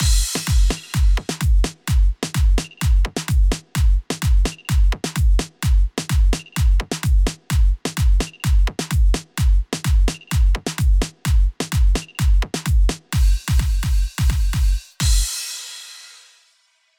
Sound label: Empirical Labs Distressor test drums Distressor parallel; uad